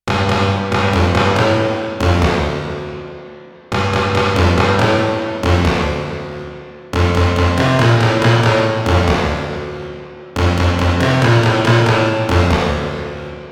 Compressed Reverb Bass

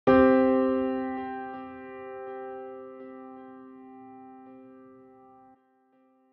C Minor 1st and fifth

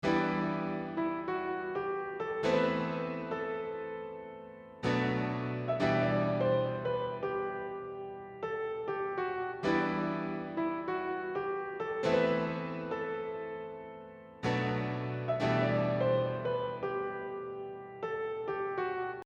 LoFi Hip Hop Beat

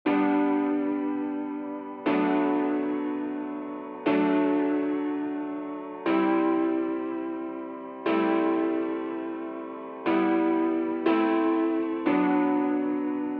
G nice prog

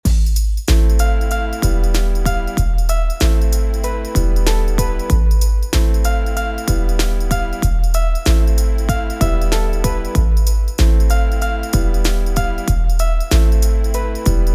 Sidechain Compression Kick to Bass ON

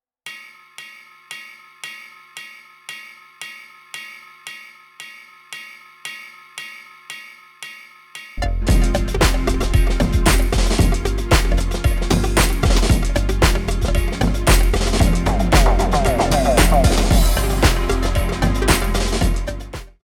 Pan Modulation Ride

